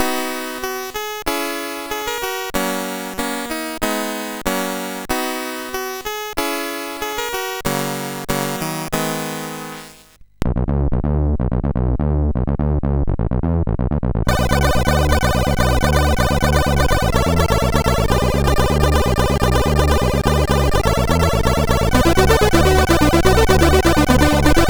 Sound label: Intro Tune Three, MOS6581R2